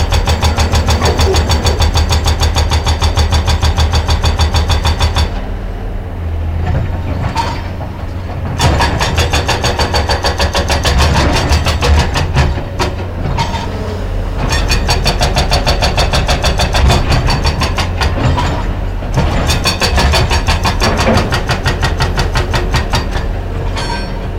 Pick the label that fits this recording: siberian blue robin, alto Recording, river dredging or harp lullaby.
river dredging